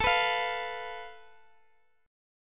A Suspended 2nd Sharp 5th, Guitar, Open G

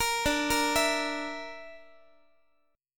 D Suspended 2nd Sharp 5th D, Guitar; Dsus2#5; arpeggiated; JGuitar

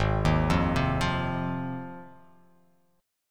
G sharp 6th G sharp down, chord

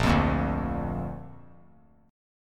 Bb Major Bb down, chord; strummed; JGuitar

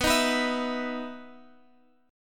B Major Add 11th B, chord

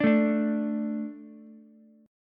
D 5th D, down, Ukulele; D5 strummed; JGuitar

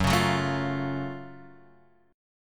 F sharp Minor Major 7th F sharp Guitar Open F; strummed

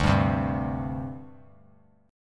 D 7th Suspended 4th, down; strummed; JGuitar

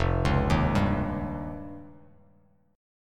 F 7th Suspended 2nd Sharp 5th F Bass Standard; arpeggiated; chord; F7sus2#5; JGuitar

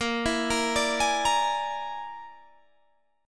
Bb 7th Flat 5th Bb, Guitar Standard; Bb7b5; arpeggiated; JGuitar

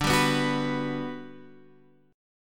G Major 7th D

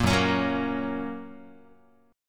Gb Major, Guitar; Gb strummed; JGuitar

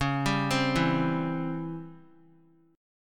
C sharp Minor Major 7th Flat 5th; arpeggiated; 25,26,27,26; 3C,3F,3A,3D

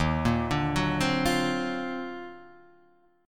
Eb Minor Major 13th, Guitar, Open D

